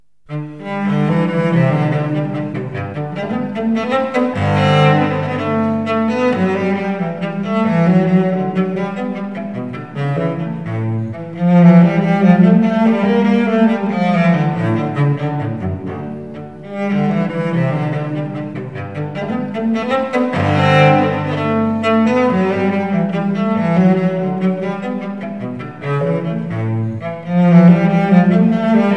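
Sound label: unaccompanied cello suite; 사장조; 무반주첼로모음곡 1번 사장조 bmw 1007; bach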